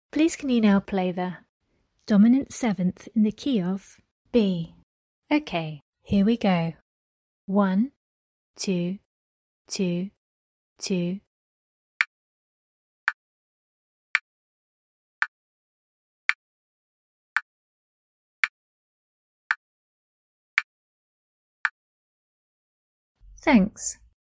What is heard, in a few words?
dom 7th in the key of B